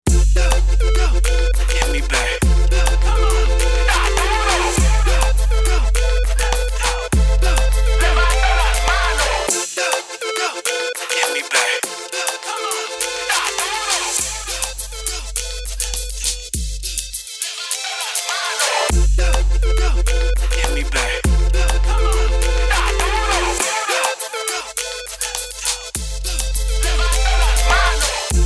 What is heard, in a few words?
sp-404sx effects isolator